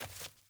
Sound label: Sandals Walk Dirt, Footsteps; Characters; Player